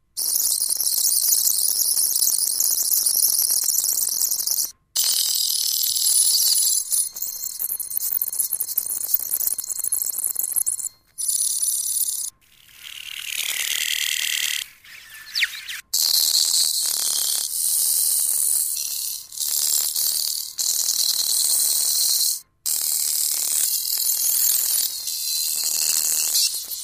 betaSP, videomagnetband betacam sp shuttlemodus

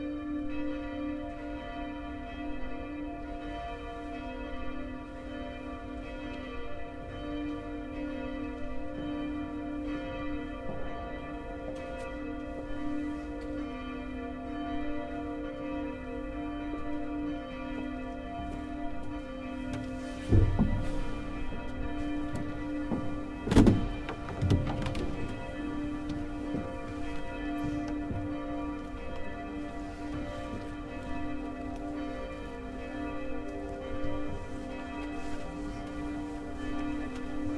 Wetzlarer Dom

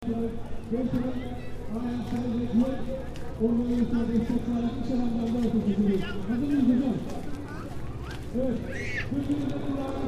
tuerkisches Volksfest, dusseldorf oberbilk

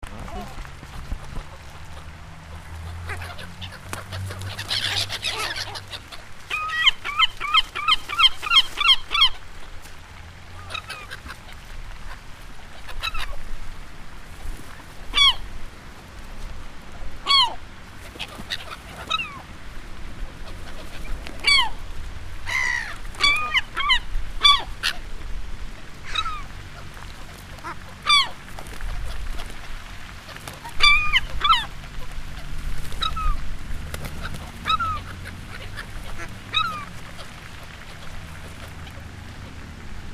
moewen im park

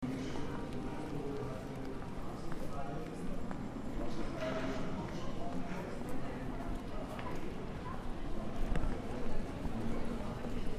elisenbrunnen aachen

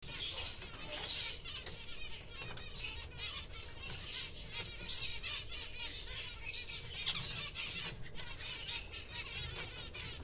zoohandlung voliere

